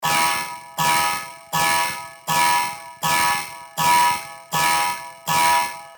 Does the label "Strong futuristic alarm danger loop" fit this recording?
Yes